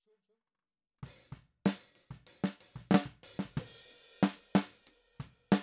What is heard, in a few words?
Snare